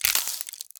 Freeze

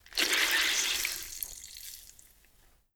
Sponge Squeeze

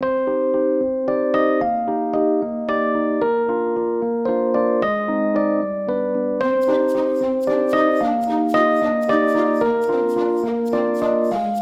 Space Waltz; mechstermination force windows gamerip 2020

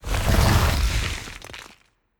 Debris

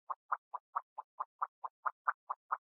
Inflater Idle Loop; Hollow Knight

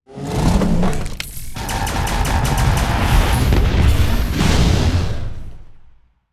Piercong Shot; starbites

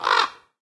AMB Village; gamerip

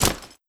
Orc Clothes

